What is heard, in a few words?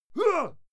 male fight attack hit; soundpool